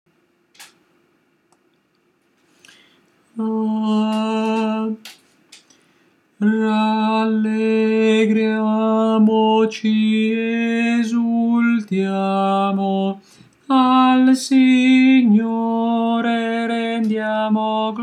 tenori

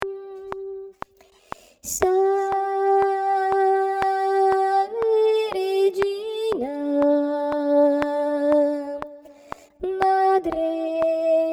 salve regina, soprani

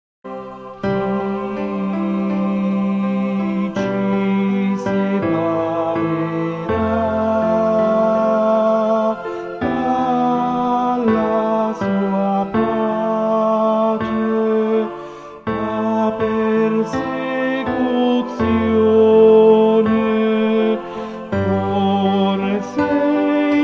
tenori

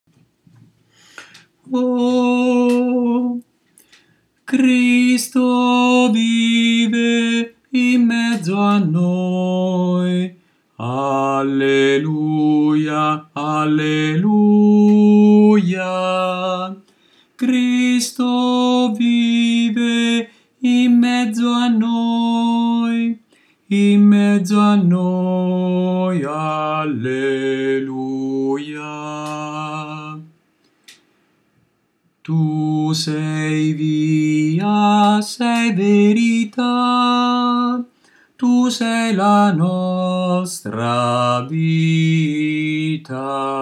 tenori

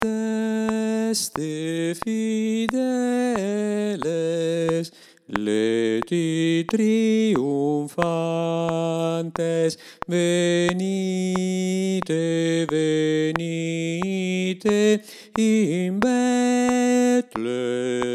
bassi

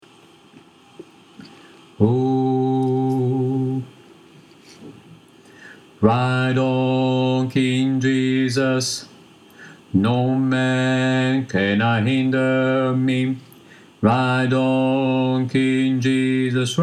bassi

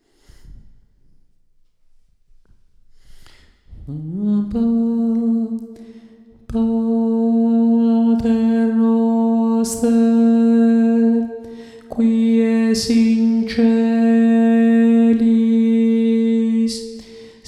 padre nostro, tenori

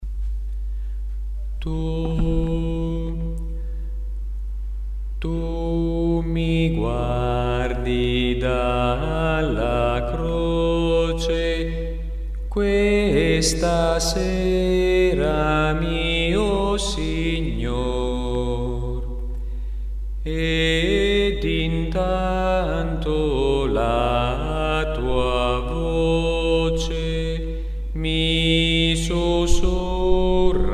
bassi

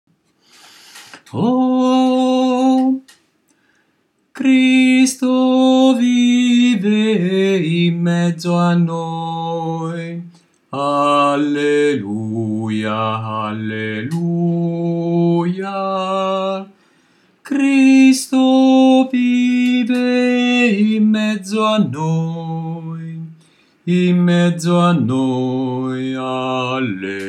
bassi

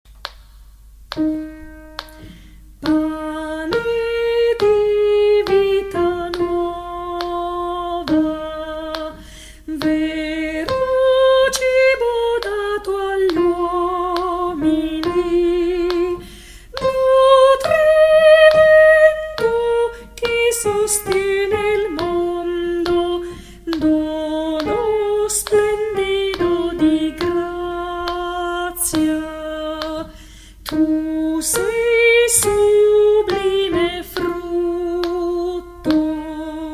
soprani

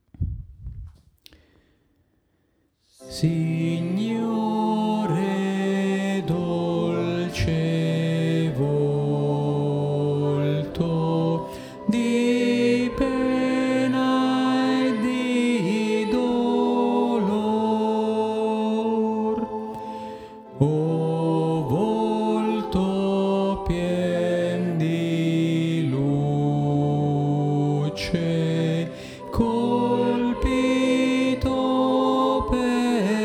soprani

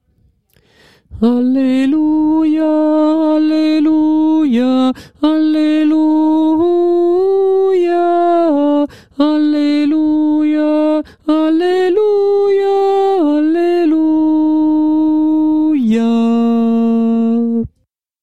contralti; concordia

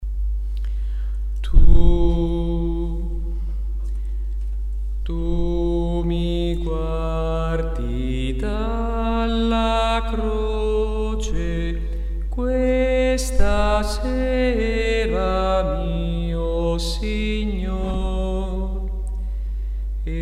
soprani

